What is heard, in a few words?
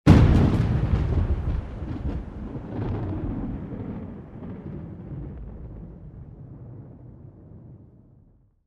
Amb Weather; Red Orchestra Ostfront 41-45